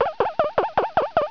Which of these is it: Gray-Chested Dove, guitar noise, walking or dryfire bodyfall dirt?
walking